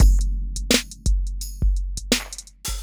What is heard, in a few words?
hip-hop drums flamming